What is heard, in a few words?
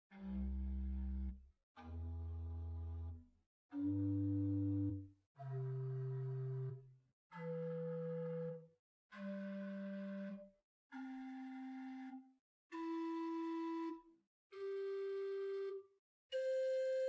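Gedackt 8 arpeggio, chamber organ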